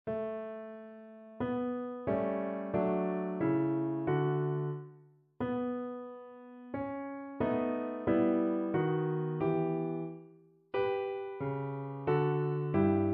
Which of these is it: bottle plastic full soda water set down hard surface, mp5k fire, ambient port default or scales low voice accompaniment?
scales low voice accompaniment